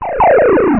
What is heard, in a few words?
big zapper